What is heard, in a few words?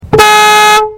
X5 xDrive30d Horn Outside